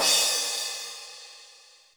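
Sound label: cymbal, drums; Best Service; Real Mega Drums VOL-1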